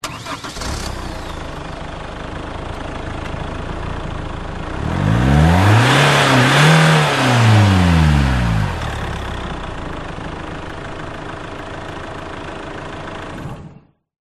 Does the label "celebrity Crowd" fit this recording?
No